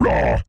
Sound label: ogre attack; The Monster Plane; kolmods preatt